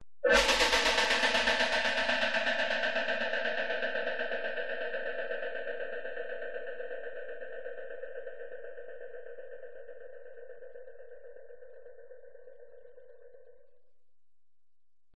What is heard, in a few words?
Monster Fly; audio hero